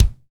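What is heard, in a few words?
bass drum, Roland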